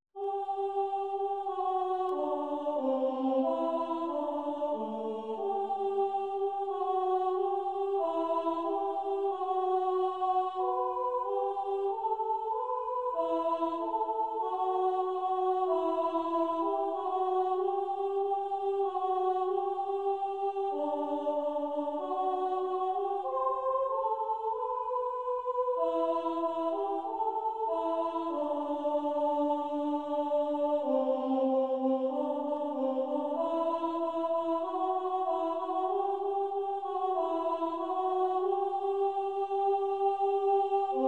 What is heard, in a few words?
Alto Part; Jesus Youre My Priceless Treasure